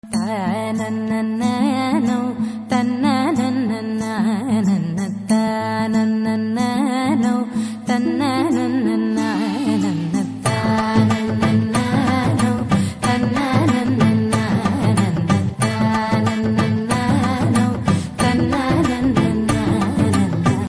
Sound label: BG Humming Tone